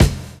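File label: Kick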